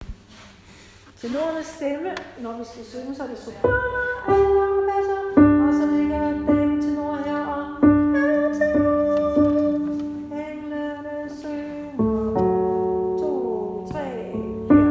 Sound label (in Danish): tenor; en stjerne